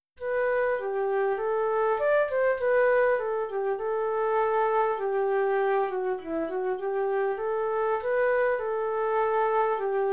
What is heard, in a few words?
Dearest Jesus Flutes